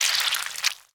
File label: meat squeeze